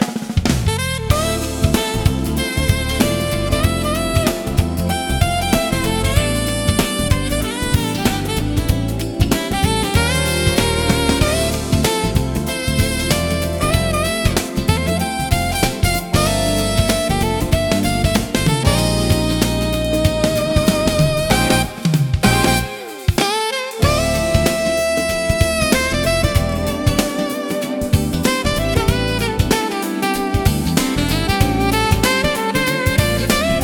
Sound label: funky jazz fusion for podcast saxophone 95bpm